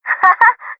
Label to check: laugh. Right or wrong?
Right